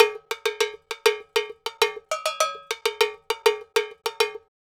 Cow Bell, Latin Percussion

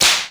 SLAP, DRUMS; Crypton - DrumBase5000; T1 DRUMS

